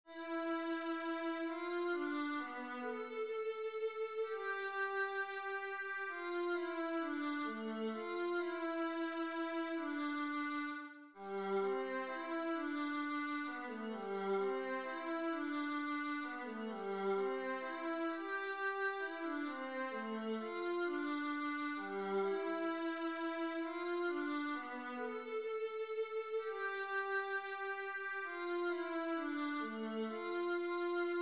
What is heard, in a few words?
concerto e minor viola